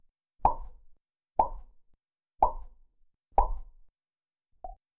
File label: two quick bouncy boing